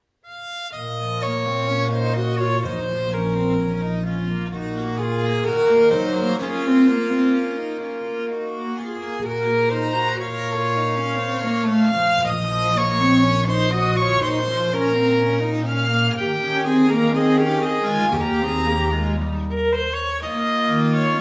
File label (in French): quatuor en si mineur allegro moderato